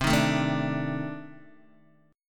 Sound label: C Minor 6th Add 9th C Guitar DADGAD